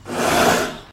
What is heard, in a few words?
lion hurt; minecraft; technic